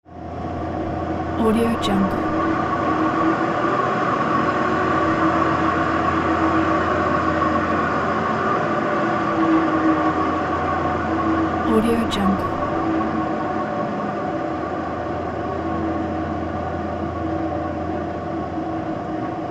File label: industrial cooling fans